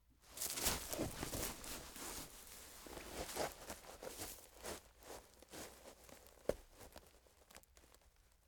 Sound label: Standing Up Mulch